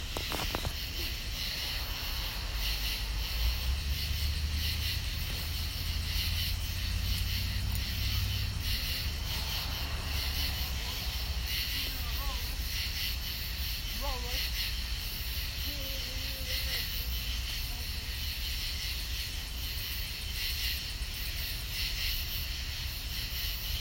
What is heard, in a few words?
Bat noises